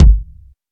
nice kickdrum sound g key, Royality free kick single shot tuned to the G note.